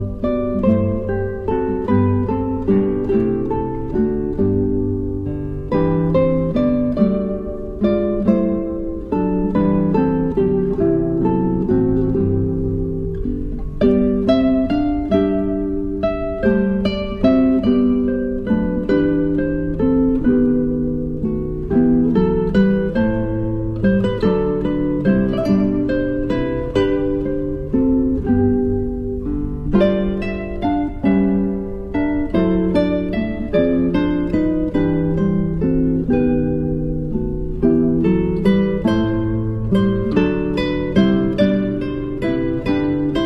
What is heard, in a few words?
Guitar Quartet